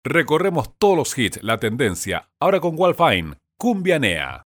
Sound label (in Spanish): Cumbianea